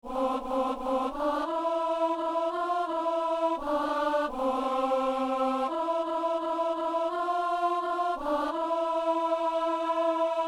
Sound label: alto; Messe du 17 janvier à Saint-Denis